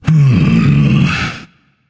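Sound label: non-verbal sigh; smite; atlas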